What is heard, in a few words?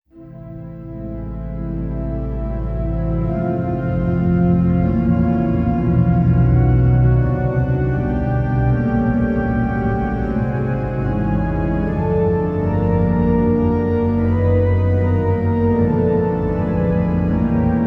Intermezzo For Organ